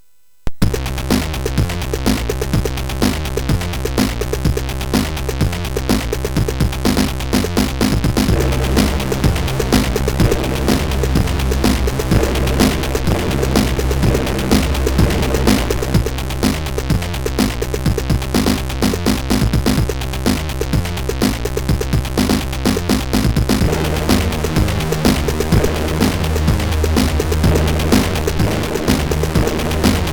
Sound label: Just Barrel, MOS6581R3; hvsc